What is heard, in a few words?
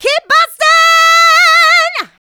Female Vocals; Houseworx